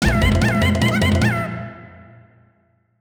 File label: Collect 100 Bananas; Donkey Kong 64